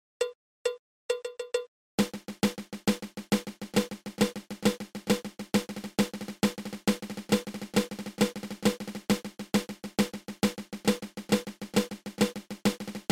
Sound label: Flam Drag